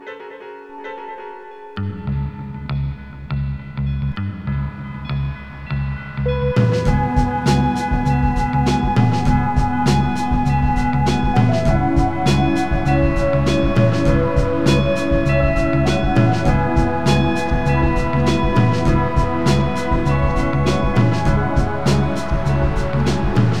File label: Sea Shanty; Pinball 4; pro pinball trilogy 2001; dreamcast gamerip